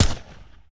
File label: uzi silencer; STALZONE